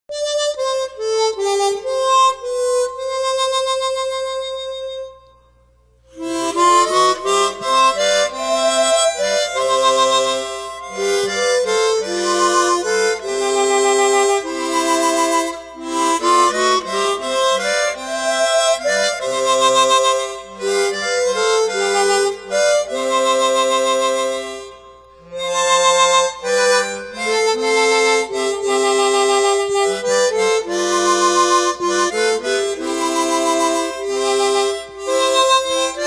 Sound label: Hymns On Chromatic Harmonica; God Will Take Care Of You